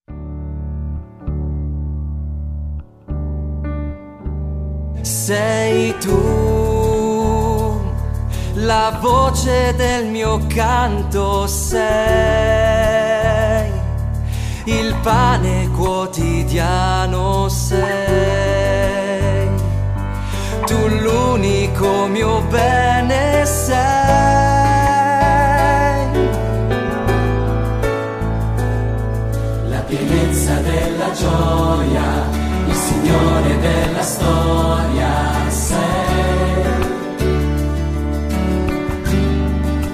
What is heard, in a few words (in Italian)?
Voce del mio canto